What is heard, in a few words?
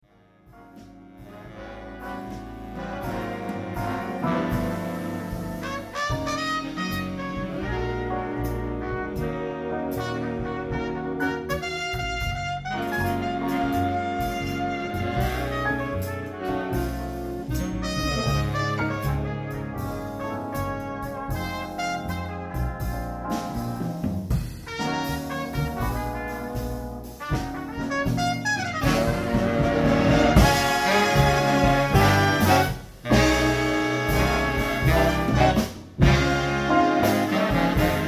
big band